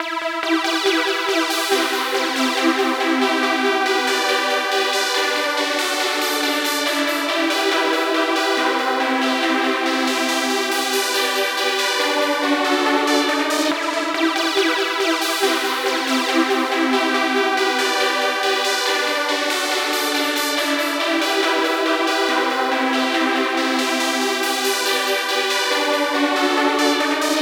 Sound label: 140BPM E; Vengeance - Essential Clubsounds Vol.4